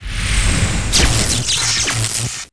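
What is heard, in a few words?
Lightning Armor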